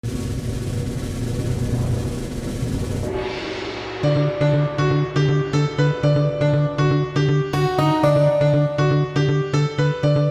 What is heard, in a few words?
Swing